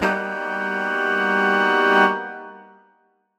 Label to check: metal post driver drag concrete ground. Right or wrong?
Wrong